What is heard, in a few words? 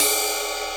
SQTM, Sequential Tom